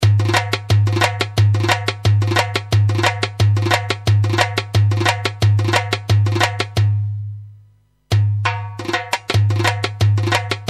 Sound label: darbuka; roland gw8; trakya